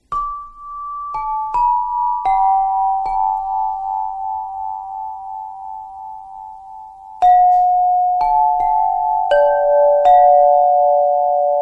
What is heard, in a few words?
Angel Sound